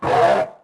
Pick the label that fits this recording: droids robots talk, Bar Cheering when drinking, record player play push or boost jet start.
droids robots talk